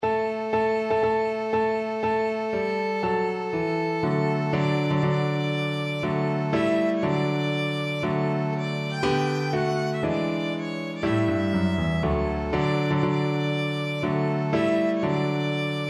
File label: wedding march, violin